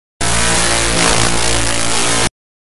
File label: jumpscare; noodle